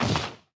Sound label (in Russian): Звук уничтожения карты